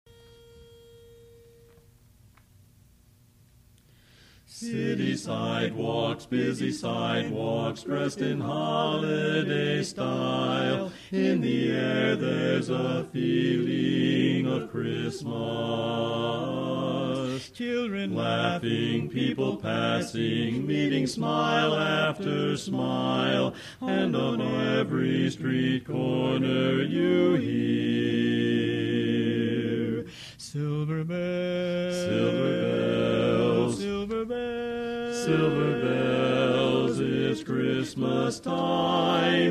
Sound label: Bass; Christmas; learning tracks